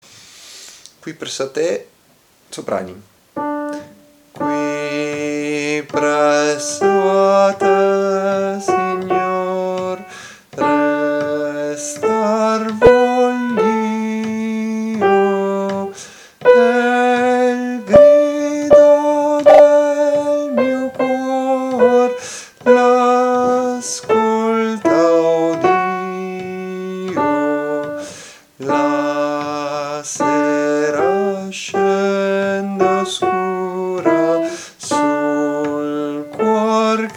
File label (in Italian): soprani; Qui presso a te